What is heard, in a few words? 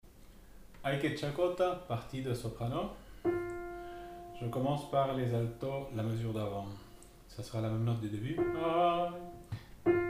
Soprano